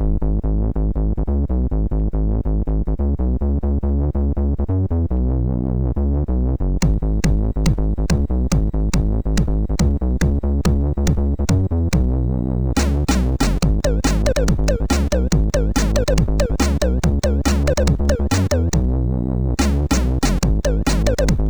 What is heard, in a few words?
Funky, MOS6581R2; Funky MrZ